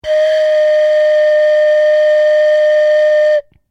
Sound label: Whistle Wooden, Simple wooden whistle; Miscellaneous Musical